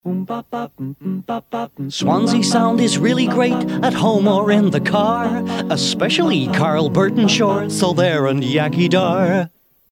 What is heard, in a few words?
Jingle; Kenny Everett; Swansea Sound 257